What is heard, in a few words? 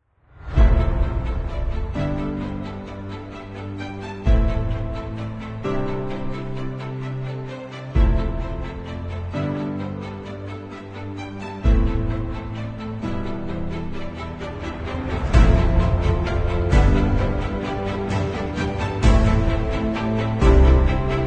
Epic Intro